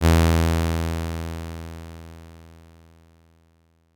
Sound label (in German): Saite F Bass 415Hz, Leise Lauten; Chor 7 (Ff); (Saiten 12 und 13); Stimmton: F